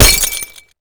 Sweetner Glass, impacts; Totaled; XBOX

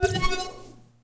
power Up

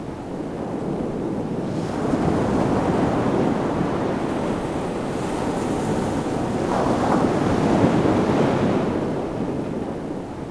ocean; Splashdown; XBOX; Soultree; Barrier

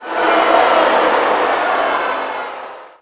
NEG Reaction,Crowd; Cars; XBOX

